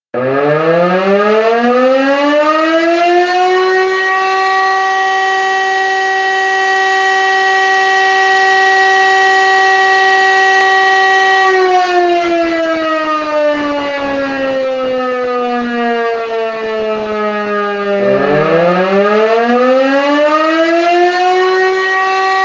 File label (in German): feuerwehralarm